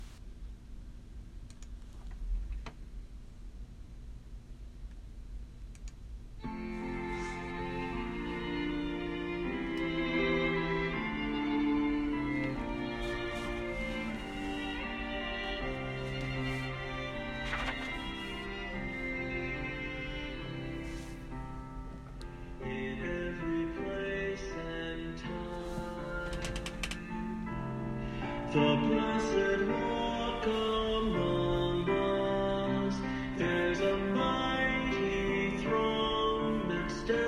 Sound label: Saints and Beloved Sop